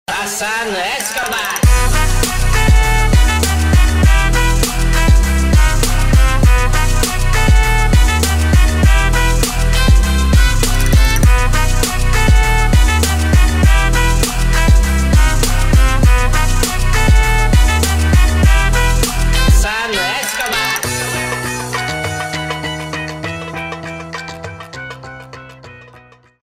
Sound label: Trap & bass